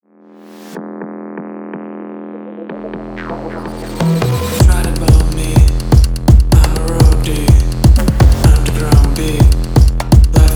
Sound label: cosmic updrift